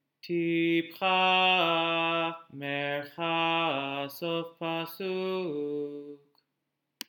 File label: Torah Trop Pattern